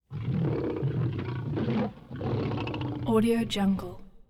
lion big guttural growls snarls

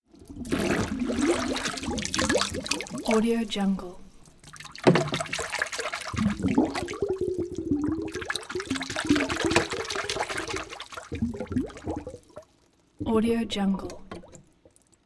underwater bubbles bursts

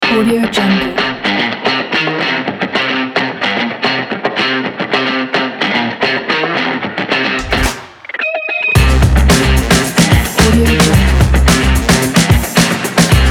action funk

